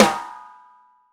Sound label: AMBSN, ST AMB SNARE